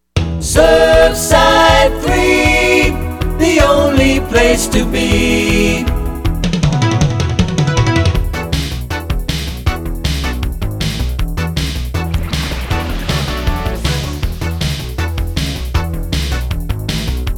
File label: Jingle; Surfside Three Marina